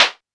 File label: technox clap; Quasimidi Technox